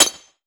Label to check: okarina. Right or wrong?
Wrong